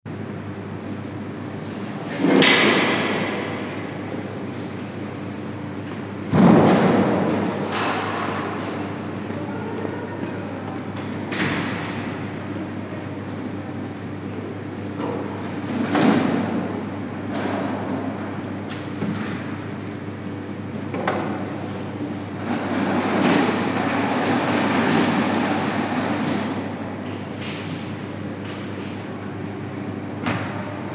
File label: large canteen some action no people